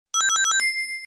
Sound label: Rings; Sonic